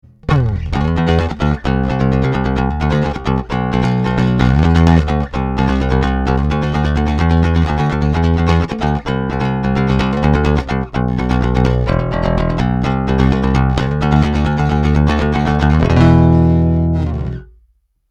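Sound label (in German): JB-75 SB Vintage Series Pick Neck PU Tone voll auf, bass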